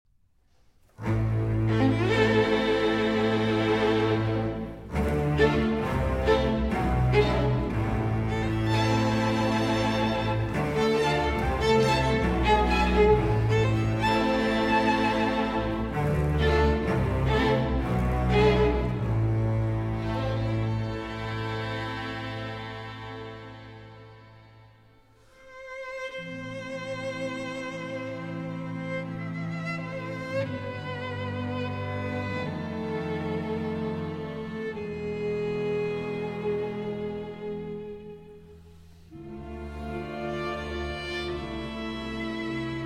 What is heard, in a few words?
Agnus Dei For Solo Violin, Vocal Quartet, Percussion and String Orchestra